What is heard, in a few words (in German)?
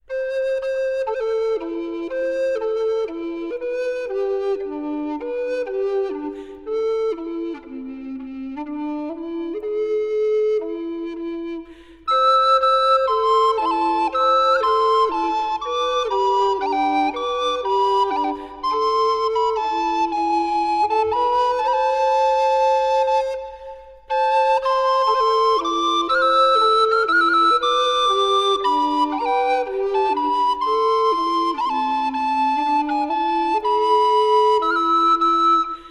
Greensleves Flöte